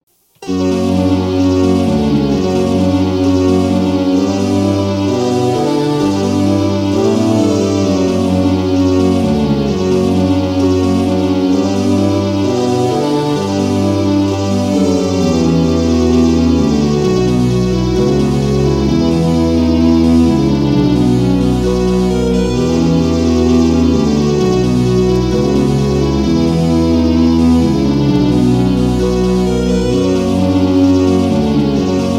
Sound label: Buy-Sell Music; Tyrian 2000